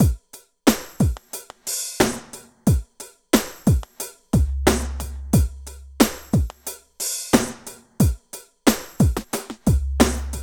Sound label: 98 LOOP -L; East West - Phat & Phunky CD2; 90's Sample CDs